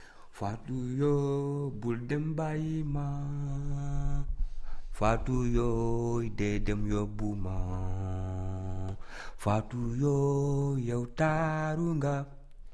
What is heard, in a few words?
fatou yo wolof, chorale; chorale intergalactique